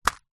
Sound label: household ice cube tray containing loose ice cubes down on soft surface